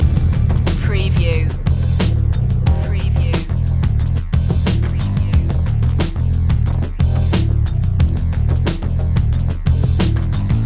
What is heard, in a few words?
icescape loop